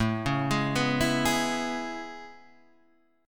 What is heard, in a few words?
C sharp Minor 7th A; C#m7